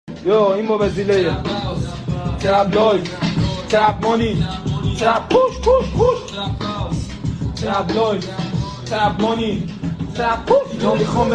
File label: trap